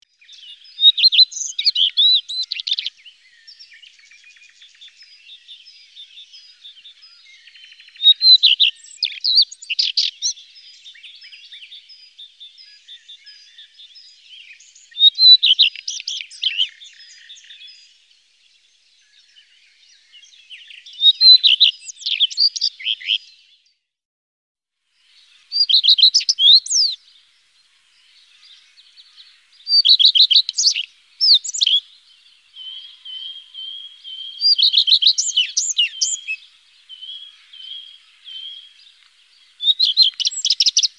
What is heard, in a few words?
gekraagde roodstaart common redstart